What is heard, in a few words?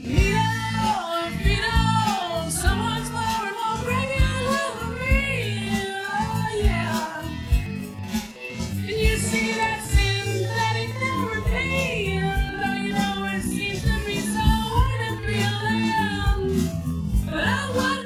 voice drums; sound source separation; ADRess